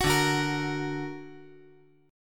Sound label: D Augmented, chord